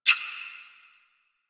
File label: Medusa Button Timer; Sentient; Warframe; The New War; Sentient Devourer